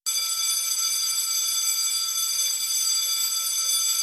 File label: ring